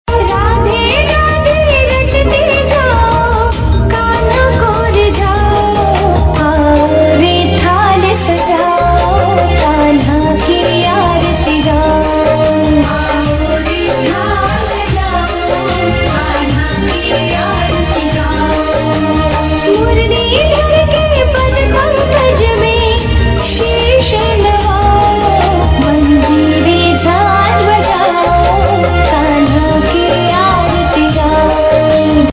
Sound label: Krishna Aarti; Saath Nibhaana Saathiya